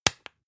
spice jar open hard; industrie wirtschaft; gastronomie

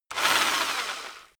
rock drill rev high short; bohrer; soundpool

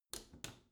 bathroom cabinet close soft slow; objekte; tueren

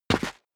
step boots concrete grit single left; schritte; Footsteps

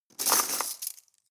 cutlery teaspoons drawer grab

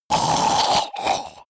monster choking zombie dying; koerpergeraeusche; comedy-cartoon; soundpool

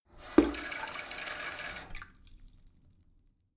sink fill splatter medium short; haus-garten; badezimmer

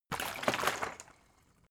bottle water big upend; berufe

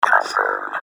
confirm

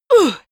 female attack

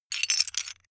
metal pieces clatter short

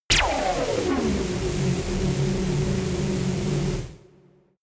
space shuttle engine start idle high; raumschiff

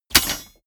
drop metal bar small

